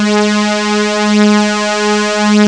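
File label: VS strings, Prophet; Rubber Chicken Software Sample Bank; SCI PROPHET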